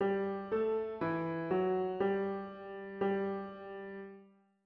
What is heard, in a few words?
melodic dictation